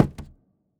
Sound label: phys cube md; Portal 2